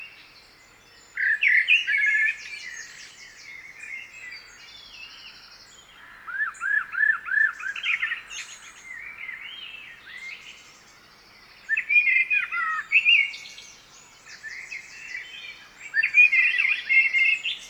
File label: Blackbird